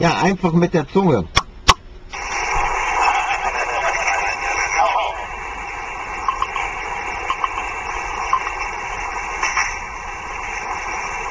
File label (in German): schnalz